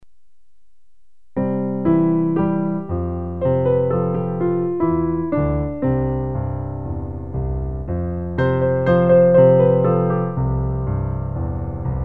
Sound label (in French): piano